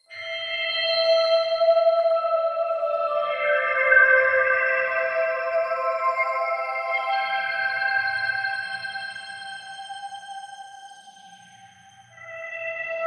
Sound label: Bathroom FX Stems Loop; Headlander